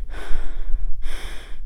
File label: female, Realistic Fatigue Voice; oblivion